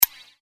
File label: general click